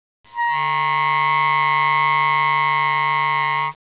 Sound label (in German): ueberblasen klein, multiphonic